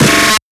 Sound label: breakbeat easy